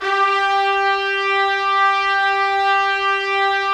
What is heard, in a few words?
Trumpets; Roland - LA Composer vol. 4